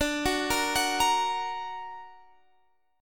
D Augmented, D, down; D+ arpeggiated

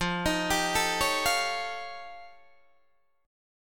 F Augmented 9th F Guitar Open D, chord

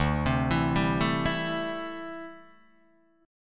D 9th Suspended 4th D down Guitar Open D

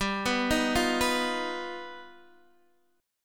G Augmented 7th, Guitar DADGAD

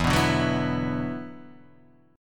E 7th Suspended 2nd, down

